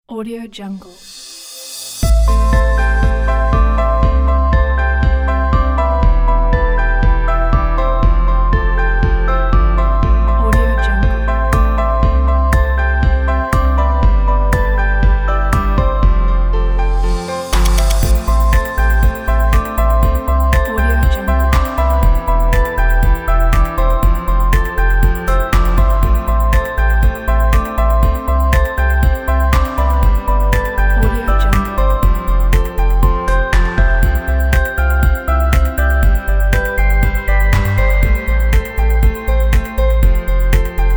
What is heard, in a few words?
sunny inspiring pop